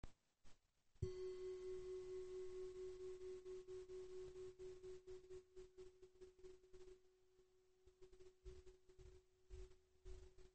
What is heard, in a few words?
Om Tryambakam